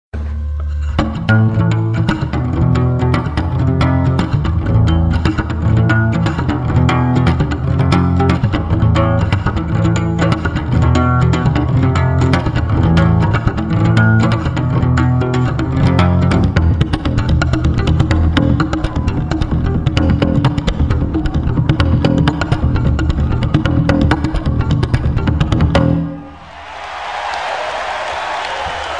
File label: best bass solo music